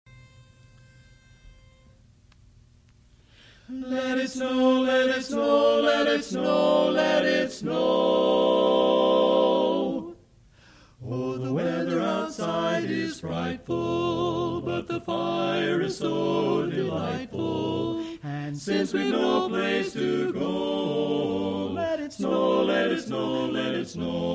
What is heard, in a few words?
Tenor; Christmas; learning tracks